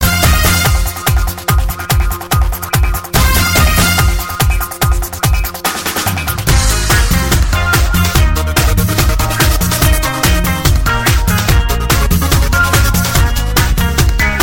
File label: comedy theme ringtone